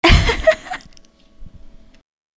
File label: Vocal, Infinite Soul